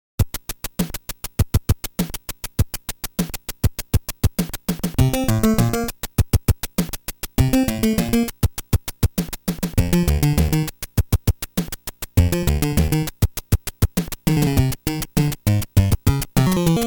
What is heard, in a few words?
underground; smb3